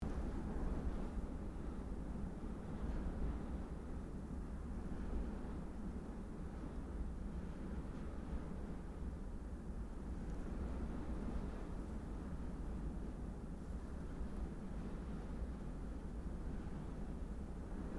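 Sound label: ambience desert; deadmaze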